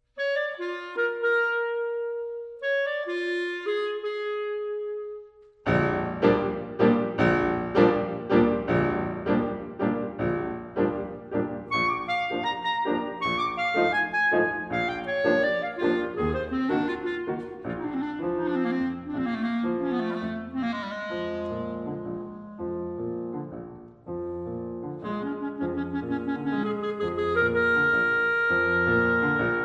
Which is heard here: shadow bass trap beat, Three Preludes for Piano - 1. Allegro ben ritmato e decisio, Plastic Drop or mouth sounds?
Three Preludes for Piano - 1. Allegro ben ritmato e decisio